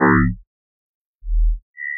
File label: Heavy Bass Note